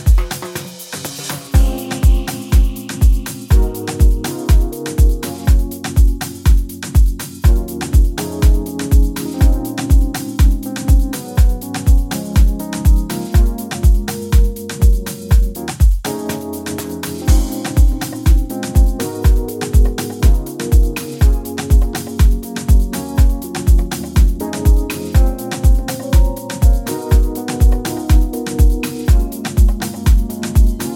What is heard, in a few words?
Groove Instrumental